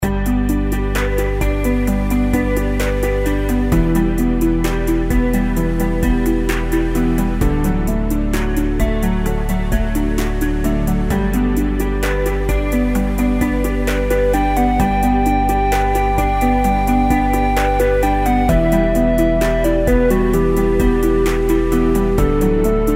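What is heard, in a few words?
reel spin background, horoscope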